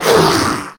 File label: hurt, zombie